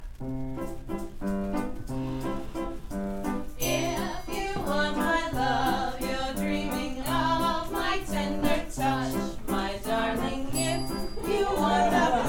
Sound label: sweep